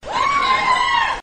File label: woo spring break girls; ooh ahh; ho hoo woo